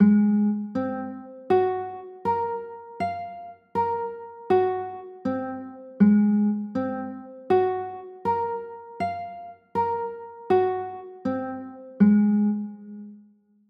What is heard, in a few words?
a flat 6